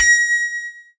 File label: desk bell